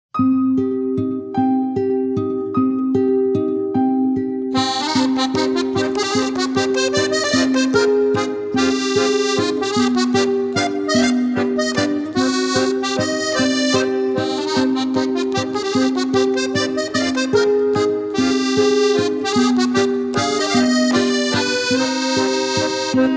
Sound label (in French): Valse Ou Musette